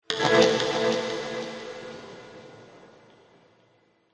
horror stab dark synth dramatic suprise

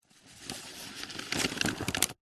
industrial tape measure retract slow close up

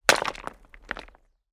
impact rock large rockpool dry

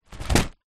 leisure kite nylon hit ground

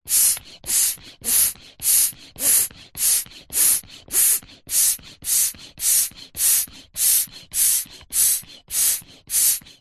plastic hand pump pumping fast nozzle end; zapsplat

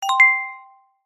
multimedia notification bell glassy chime

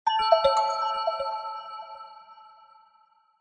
sound design twinkle mysterious warm musical; zapsplat

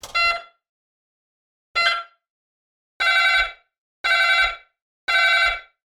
household intercom entry system calling; sound effects one

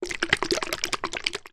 household clipper oil in small tub shake

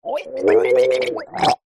horror male chock gag gargle in own blood

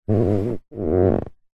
vehicles car driver seat body movement creak

